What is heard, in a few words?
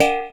BND GONG; ILIO - Ethno Techno